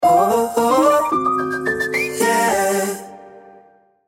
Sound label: LOGO; ARLOGIS